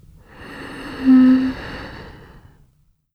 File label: ghost; 女系 霊 11; 霊の声 うう〜 単